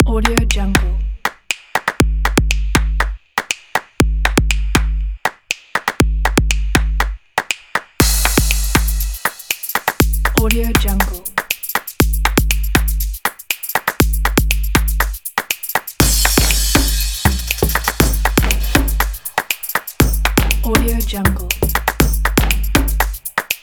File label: claps and beat; globemotion